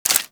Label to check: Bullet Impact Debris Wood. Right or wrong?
Right